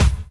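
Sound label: snare drum bass drum, Dance Mega Drums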